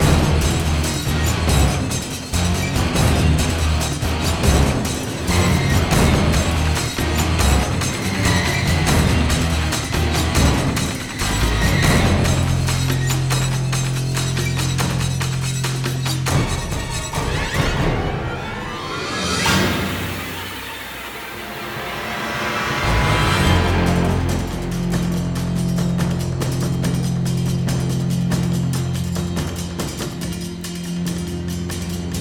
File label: action loop; the walking dead survival instinct gamerip